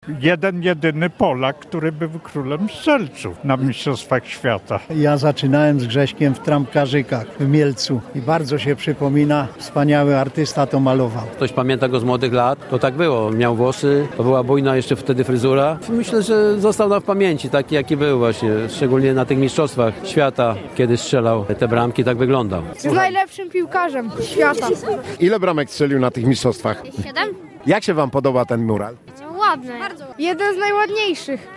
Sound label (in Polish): kibice